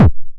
909 BD Tape Sat

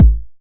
909 BD; Goldbaby Tape808 & Tape909; The Tape 909

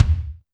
Kick, Alesis DM5

